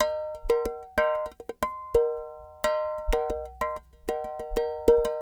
clay pot, world percussion

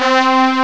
desert horns; Amiga Soundtracker Sample Packs

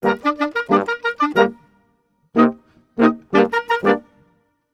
90 g min WWinds+Brasses, Orchestral arrangements; PowerFX - Downtown Orchestra

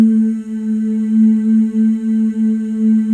FEM, Symphony of Voices; Spectrasonics

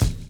DRUM CHOP; AMG Gota Yashiki Groove Activator

